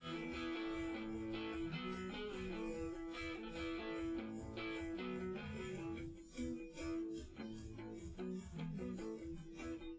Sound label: drums, live rec